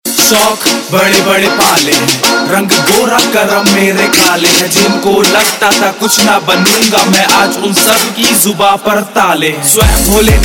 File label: Rap Ringtone, Punjabi